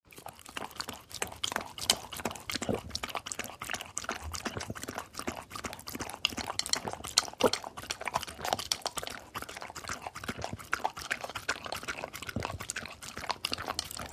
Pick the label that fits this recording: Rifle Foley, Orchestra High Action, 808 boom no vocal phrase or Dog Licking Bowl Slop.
Dog Licking Bowl Slop